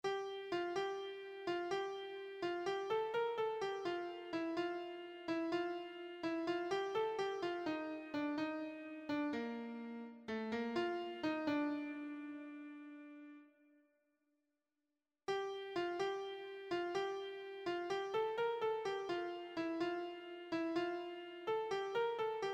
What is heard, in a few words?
lead, piano